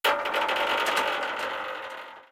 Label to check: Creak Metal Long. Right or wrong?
Right